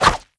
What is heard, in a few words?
jump attack whoosh